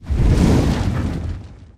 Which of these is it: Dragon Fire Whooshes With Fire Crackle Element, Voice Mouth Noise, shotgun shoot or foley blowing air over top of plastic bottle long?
Dragon Fire Whooshes With Fire Crackle Element